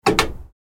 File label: telephone buttons